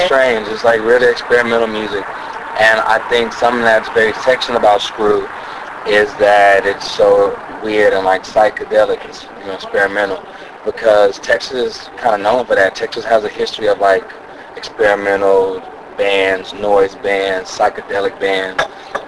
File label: Houston Music